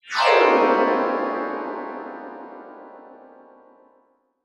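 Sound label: Music Transition Vibraphone; mallets vibraphone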